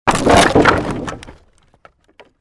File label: created wooden barrier smash medium stereo